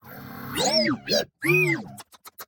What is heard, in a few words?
warm beeps; 堡垒（守望先锋2）; 热情的哔哔声